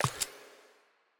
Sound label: create battle